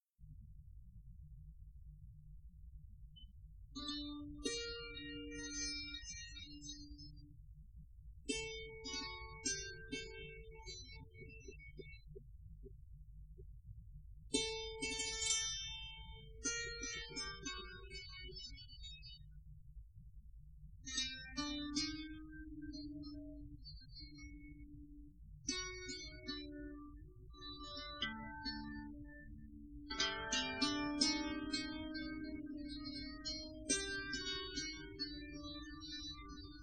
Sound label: Instrumental